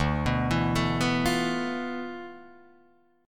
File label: D Minor 6th Add 9th D, Guitar, Open D